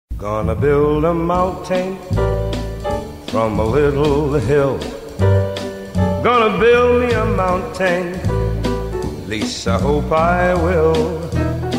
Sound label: simply noise